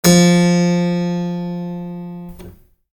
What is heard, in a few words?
hchord f4